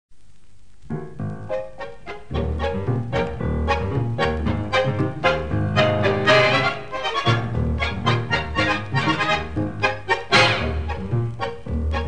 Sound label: Instrumental